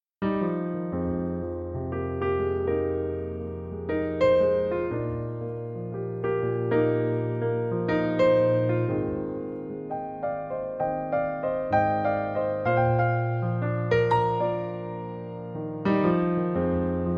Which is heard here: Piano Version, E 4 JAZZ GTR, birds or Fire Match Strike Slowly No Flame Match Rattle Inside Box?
Piano Version